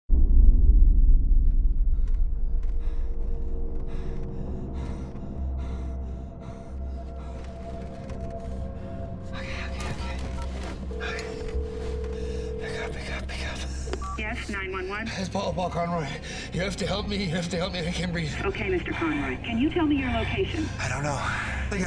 buried, soundscapes

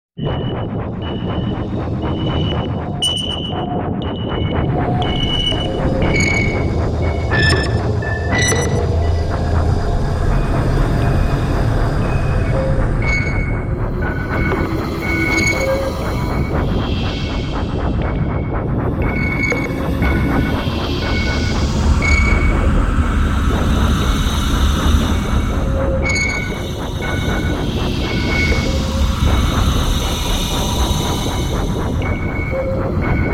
freakchimes

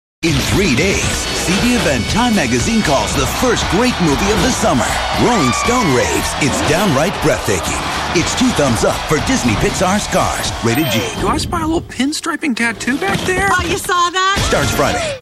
cars

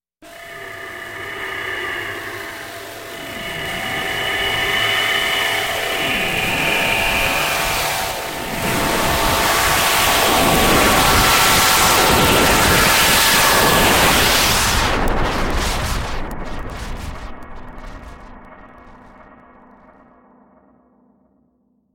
sizzle

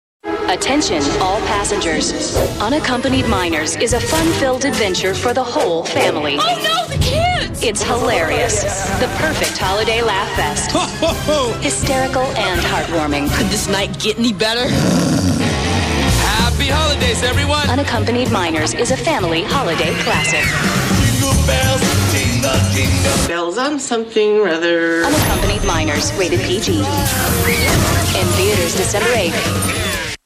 unaccompanied

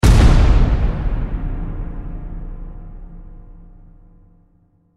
boulder strike

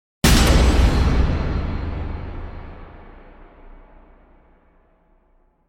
case closed, soundscapes

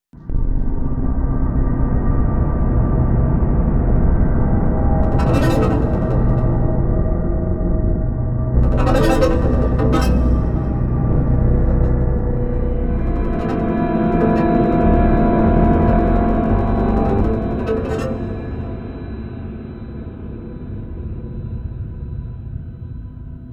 rail warning, soundscapes

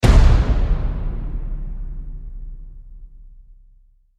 boulder strike

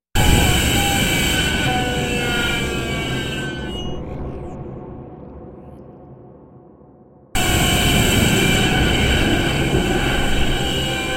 riphatch

